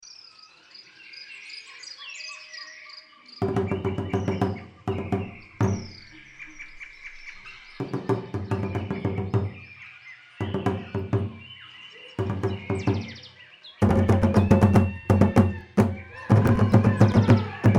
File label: Ghanaian Jungle Drums; tambores do mar